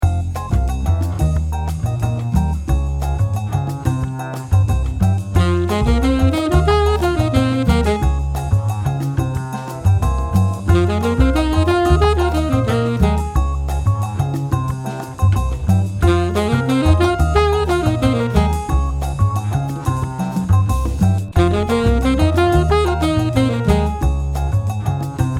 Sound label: Demo sax 90